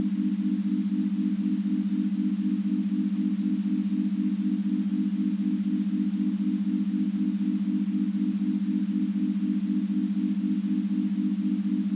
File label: Meditation No Pulse